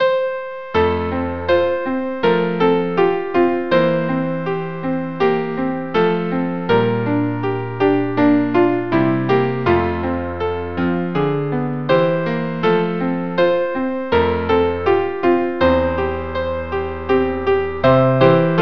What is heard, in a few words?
trio; abend schubert D 382